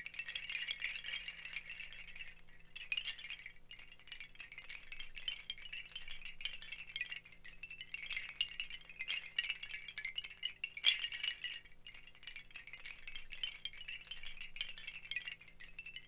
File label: BAMBOO, Percussion